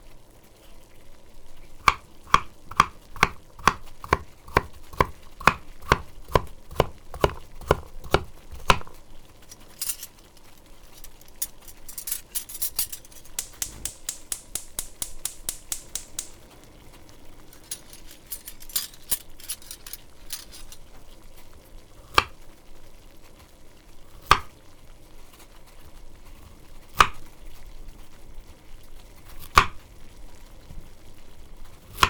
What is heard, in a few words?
kitchen sounds